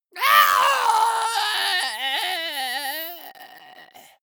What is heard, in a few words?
monster death scream high